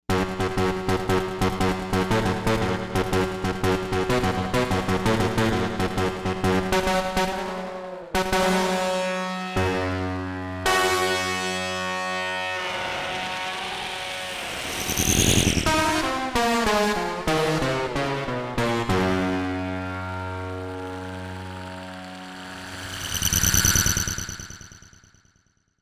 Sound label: Guitar Feed, Novation UltraNova; Factory Patches